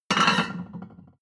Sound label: plate on plate place slide hard